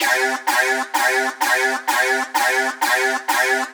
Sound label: 128 C drop